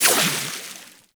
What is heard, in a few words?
Water Sprayer Beam Stop; Warframe; Weapons